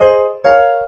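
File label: PIANO chord, Ragga & Dub Reggae; USB Soundscan vol.27; AKAI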